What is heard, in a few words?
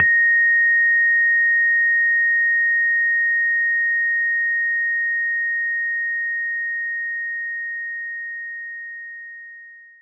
Rhodes Bb5